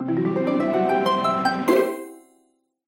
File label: win